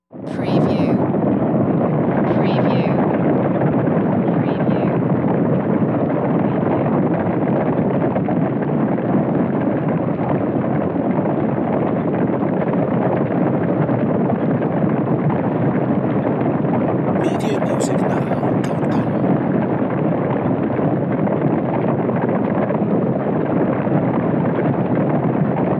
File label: Cattle Stampede